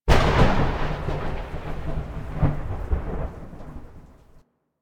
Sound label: Landscape Thunder Crack, Ambience